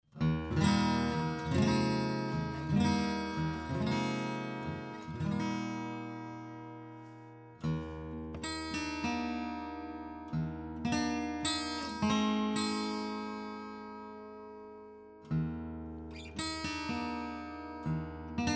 114ce C414 Open Chords; LTD

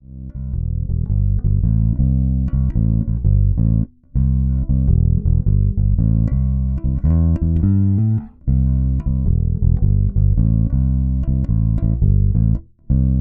Sadowksy NYC Modern Neck Pickup

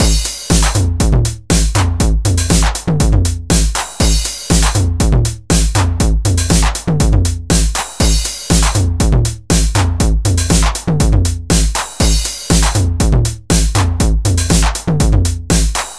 TR 909 FX; Roland Cloud TR-909

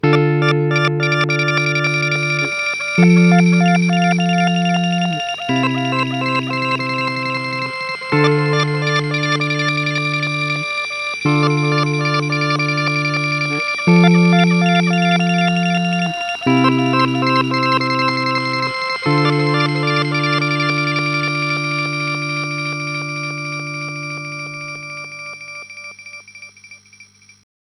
Chase Bliss Blooper

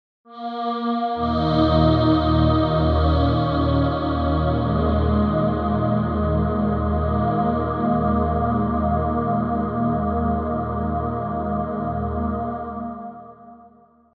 Chorpad; Proton

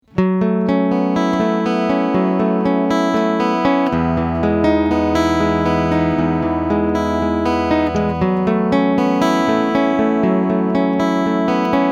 PRS Hollowbody II Piezo Piezo Picking Line

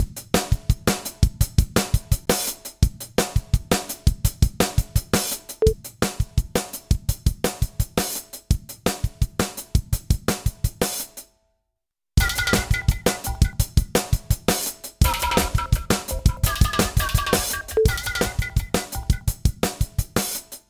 Program dependent release; DRAWMER 1973

